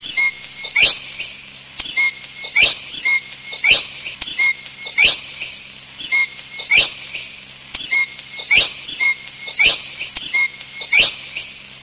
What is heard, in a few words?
bob-white; animals